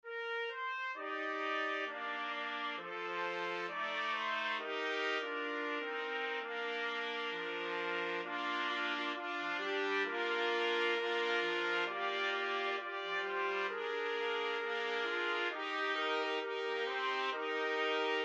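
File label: 4 trumpets; trumpet quartet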